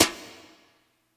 Brush Slap, Yamaha PSR E305

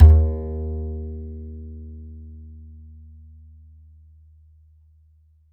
E, E string upright bass; Larry Seyer

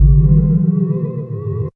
Ghosts; Jungle Warfare 1&2

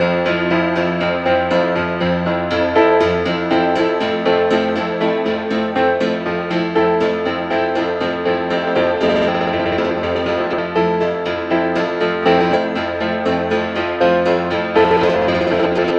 Piano Warps; Modular Electronica; Sonic Foundry (Sony Creative Software); TSS Autopilot

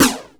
snare swim; E-MU Formula 4000 Series Vol. 2; OrbitPercMaster

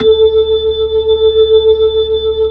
B-3, Hollow Ch; Roland LCDP10 Keys of the 60s and 70s 2

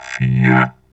VOX VOX, Electro Vox

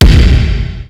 k1, Kawai K1 Drum Machines; 300 Drum Machines

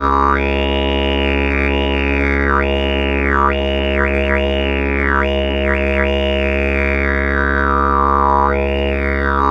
C# drone simple, Didgeridoo; Big Fish Audio; Didgeridoo and Other Primitive Instruments

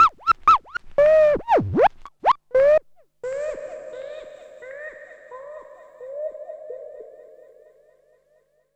Gibbon Scratch; Scratching; Zero-G Smoke

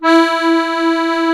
HOHNER, akkordeon

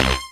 DD SYN, Disco House

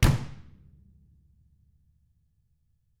DW HARD, KICK

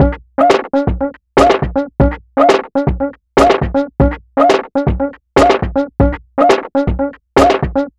chabeat 120bpm, downbeat dance grooves

